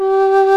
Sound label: HRP RCDR&FLT